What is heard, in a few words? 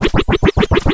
Glitch